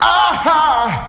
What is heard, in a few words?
"ah ha" SUNG; Voice; ooh ahh